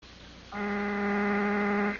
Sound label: Vibration, voder